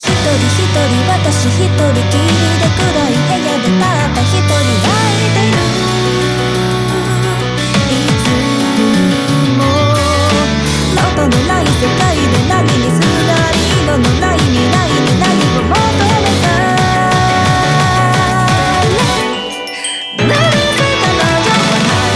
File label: Bgm Reach